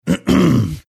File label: throat clearing